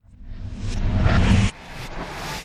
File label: door unlock; Apotheorasis